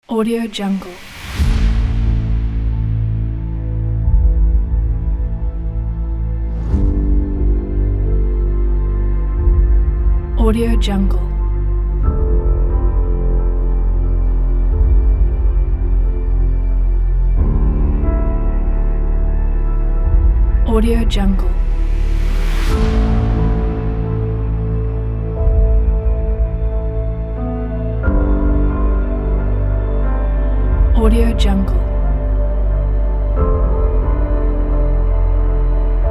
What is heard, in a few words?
cyberpunk trailer; escape from lockdown